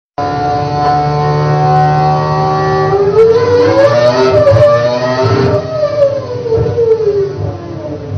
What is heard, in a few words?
Renault F1 Engine